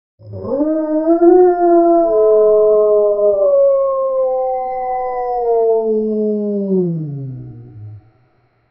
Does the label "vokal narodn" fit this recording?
No